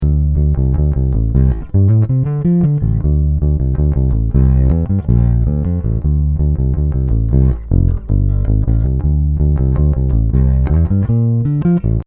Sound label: ibanez artb100 ssb neck, bass